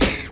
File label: KIT, Dance Mega Drums, HIP; Best Service